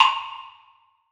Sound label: Chest Hover; netent